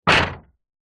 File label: impacts metal crunchy hit sweetener